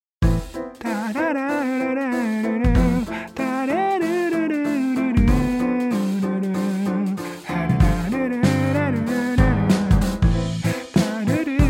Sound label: drum bass